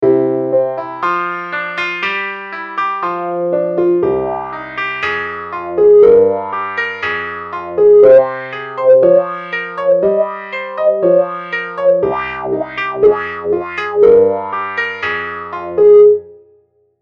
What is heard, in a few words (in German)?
Wah Wah